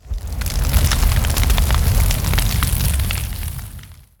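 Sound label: Flame Breath Sweet; Evolve; Goliath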